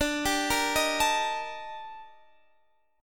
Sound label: Eb Major Sharp 11th D